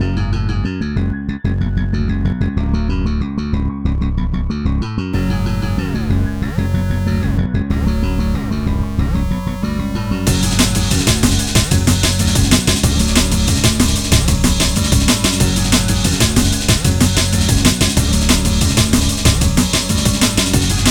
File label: Quiet, Retro, Instrumental; Cartoon Network Takeover; Windows